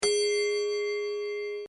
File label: Clock Mantle Hour Toll Whour Chime; Clocks; Bobby Cole